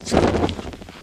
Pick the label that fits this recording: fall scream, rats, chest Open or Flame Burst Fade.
Flame Burst Fade